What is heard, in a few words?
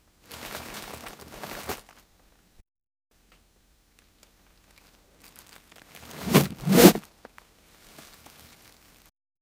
bag dropping lightly